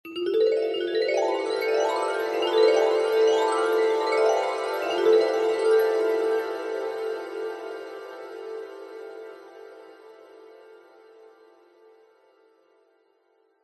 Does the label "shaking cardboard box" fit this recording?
No